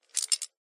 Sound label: metal thin strip twist sequence gear open slide texture; ancient game